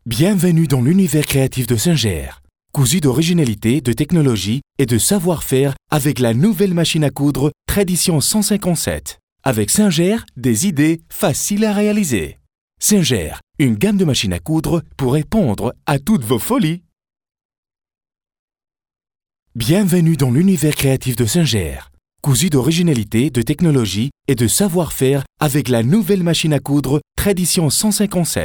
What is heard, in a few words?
SINGER